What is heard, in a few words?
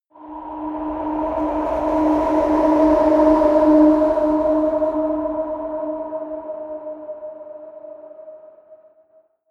air arctic wind, drones